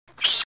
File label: HAH